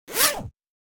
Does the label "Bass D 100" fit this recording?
No